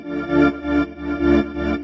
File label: wavy synth rhythm, 130; SYNTH AND ELECTRONIC LOOPS